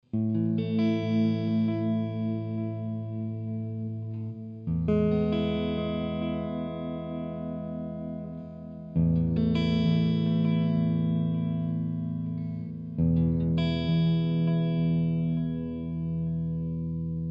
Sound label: Delay; IK Multimedia iRig HD 2